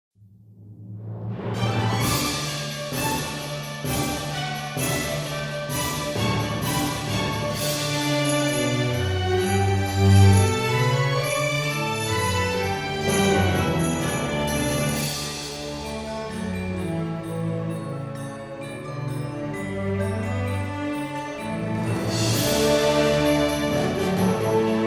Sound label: Bagpipe; Winter's Joy